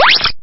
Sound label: game jump; Picture Keyboard